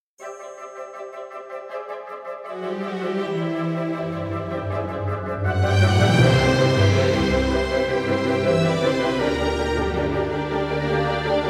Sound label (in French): Orchestrale Holywood